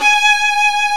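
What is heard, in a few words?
ARCO violin, Solo String; E-MU Producer Series Vol. 2; More Studio Essentials; Composer; Solo String Combo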